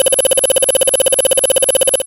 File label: futuristic user interface alert; BluezoneCorp